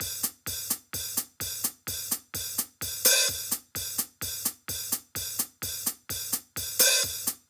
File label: 128BPM Kit; Vengeance Samples; VFH1 128BPM Crowded Kit